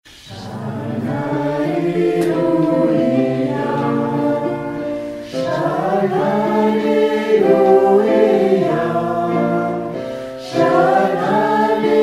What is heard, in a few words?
sein tutti